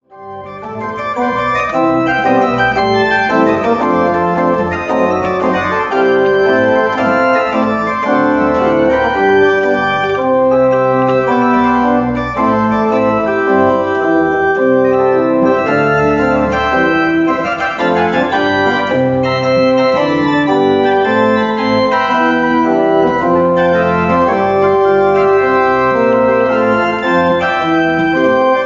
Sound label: Jazz Pop